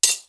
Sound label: Glass